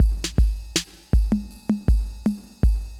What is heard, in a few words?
Synth Loop, BPM 80